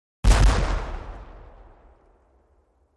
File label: Two Civil War Cannons; fire